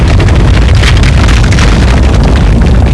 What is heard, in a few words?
roll rock; ambient; valve